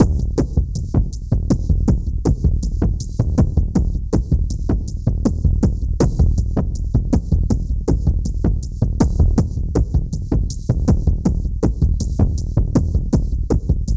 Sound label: Percussion Loop 80 Cinematics; Ghosthack